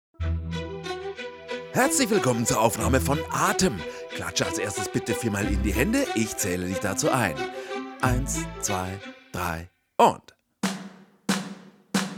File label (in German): Playback fuer Video - Chor